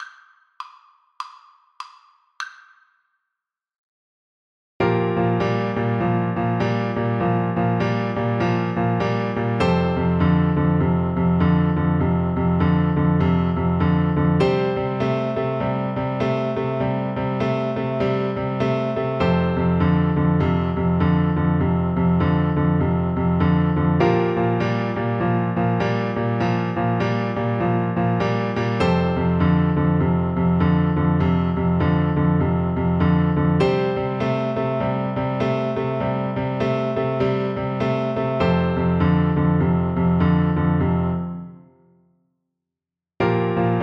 midnight special Oboe

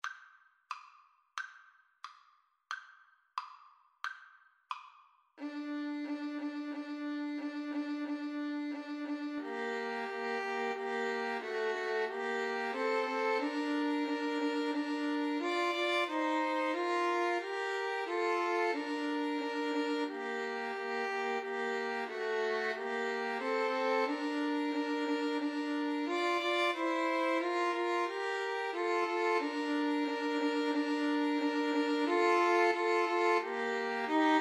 2 Violins Cello; capriol pavane

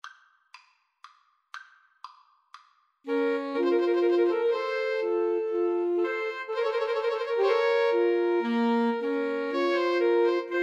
pastorale, 2 Altos Tenor Sax

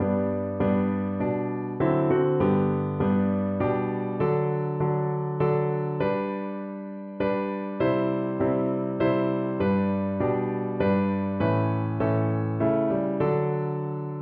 piano

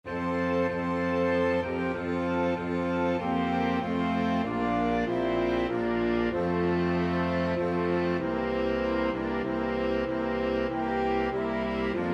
orchestra

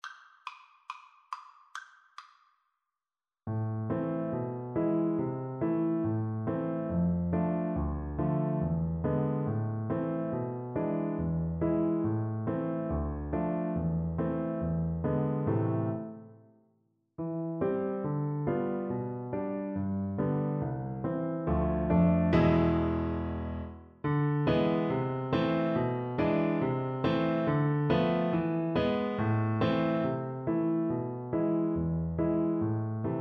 gavotte violin; lully

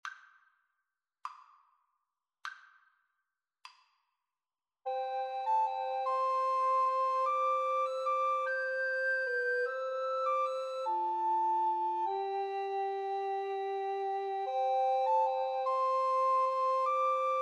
skye boat, Recorder Trio

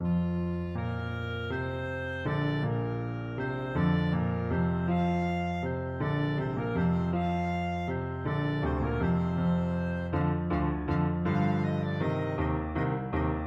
water music, clarinet; handel

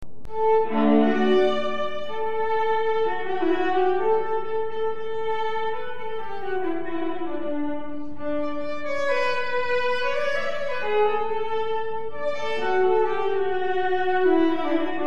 sound sample viola

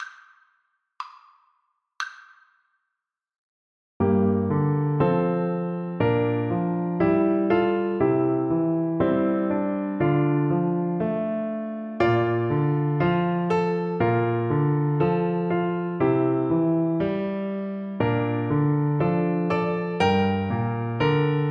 wondrous, recorder

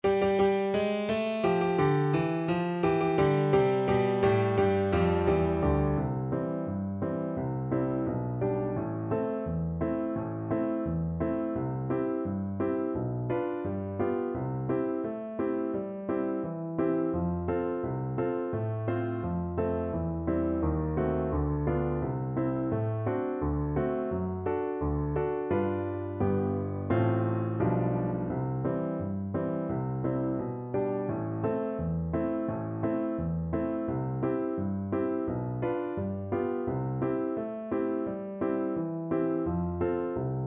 anchors aweigh, Tuba; Chas A Zimmermann - Anchors Aweigh

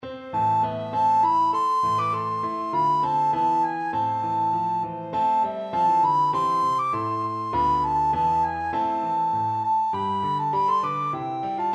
recorder

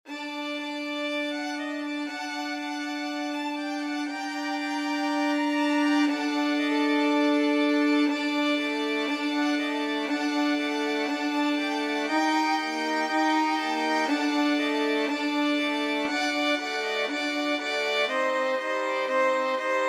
violin ensemble

